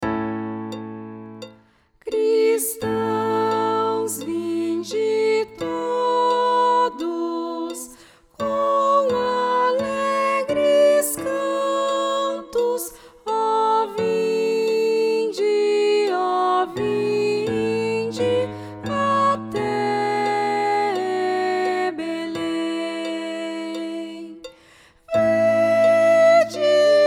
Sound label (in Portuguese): soprano